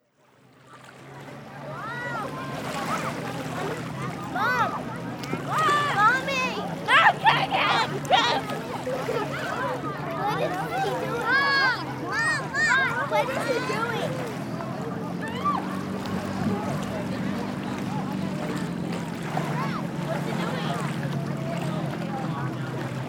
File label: children playing in water